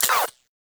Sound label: SMG Mech, Weapons; Warframe; Tenno; Protea